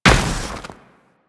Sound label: Combined Step Snow, Footsteps; Warframe